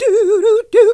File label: GOSP. Singin